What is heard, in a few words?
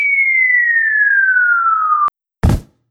pixel falling